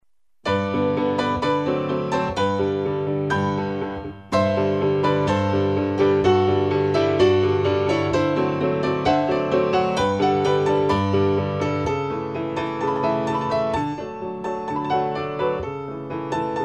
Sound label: can can; ballet class